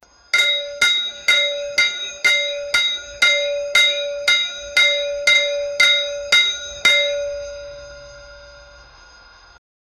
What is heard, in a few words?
puja arti with puja bell; dhak